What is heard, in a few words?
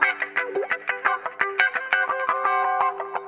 GUITAR